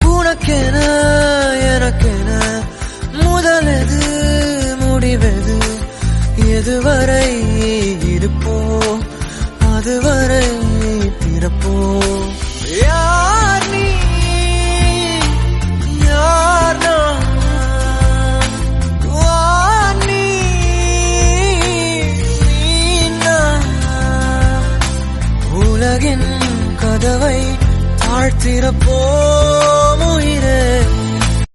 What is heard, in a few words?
Tamil, ringtone